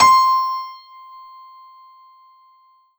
Kurzweil K2000 Bright Piano C6

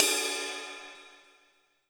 Ride Cymbal

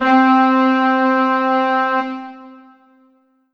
Korg M3R Ensemble C4

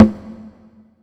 Hand Drum

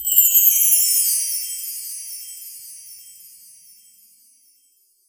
Korg TR Rack Standard Kit Bell Tree

